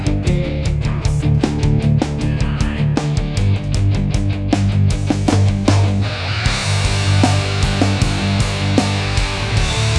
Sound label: grunge; musiclm